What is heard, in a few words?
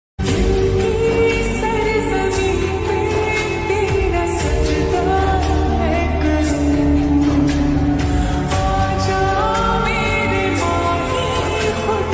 lofi version slowed lofi perfect reverb